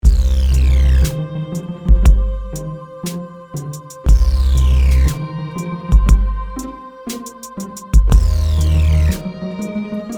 Trailer Music

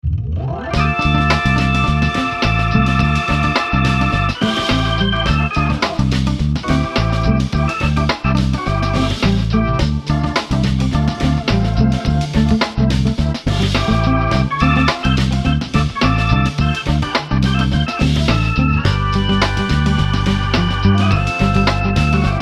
MUSIC Organ Lead

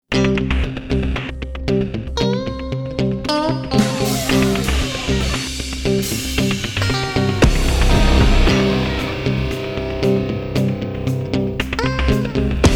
Water Blues Music

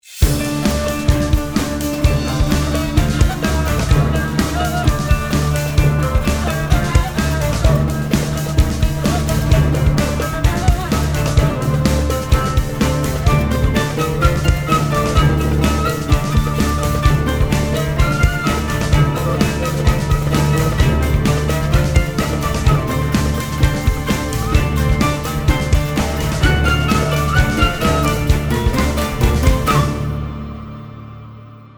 Film Music Cue Mix drum kit; Egypt

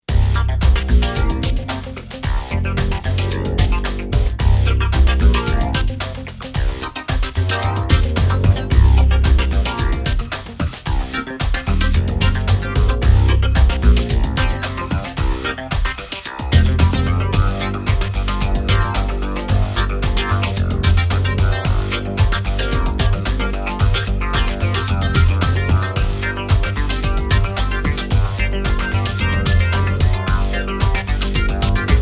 70s funk

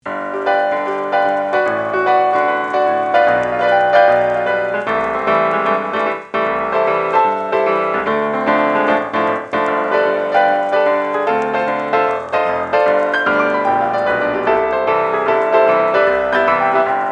Jazz Piano

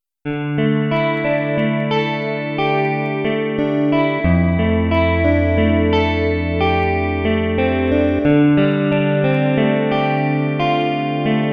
Film Music Cue no flute; Adventists Film; music samples for mary katzke lymphodema film

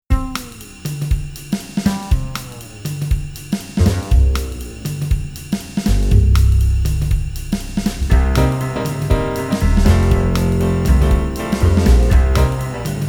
Jazz Trio

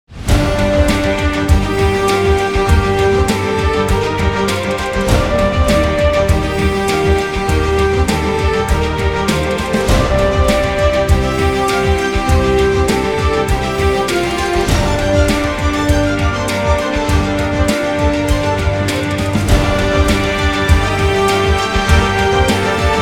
News Mix Melody; CBS

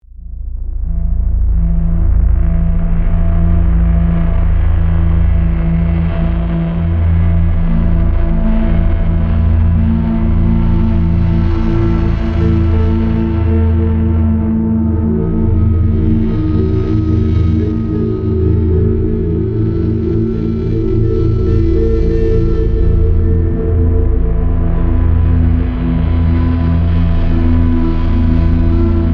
Cue Mix No Piano

